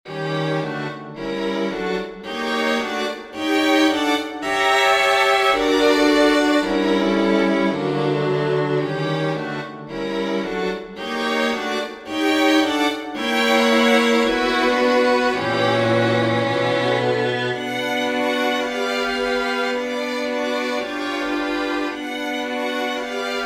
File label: for string quartet; tchaikovsky piotr ilitch; winter morning